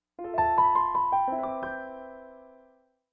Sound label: Pianissimo, Alert tones; Samsung; GT-S8600 Wave III